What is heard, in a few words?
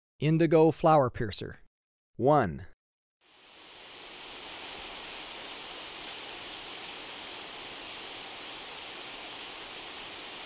Indigo Flowerpiercer, Birds of Ecuador; S. America